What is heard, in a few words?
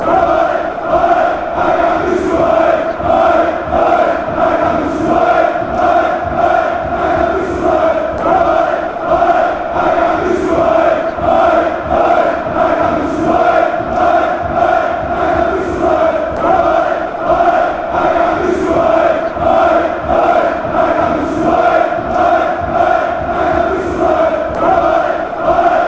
chant, fanchants; panathinaikos